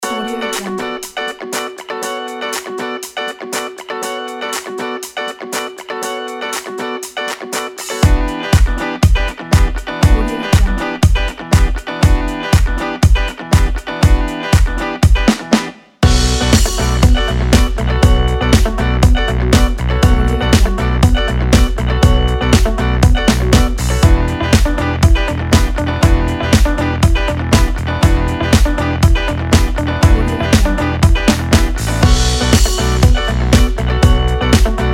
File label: the funk